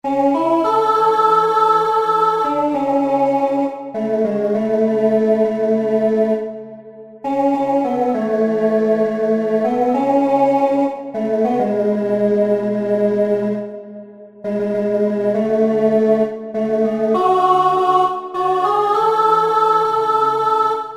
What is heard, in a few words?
Chorus Alto